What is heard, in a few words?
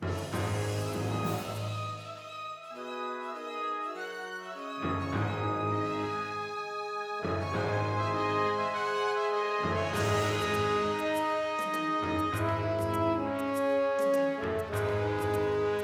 Piano; The Haunted Mansion; GameCube